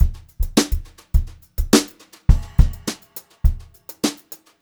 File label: 104, Brushed Drum Loops; USB Soundscan vol.39; AKAI